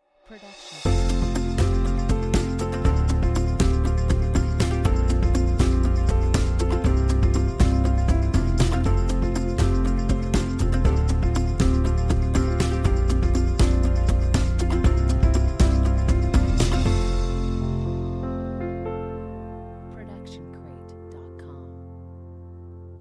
Corporate Ident; SoundsCrate

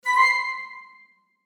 piccolo phrase

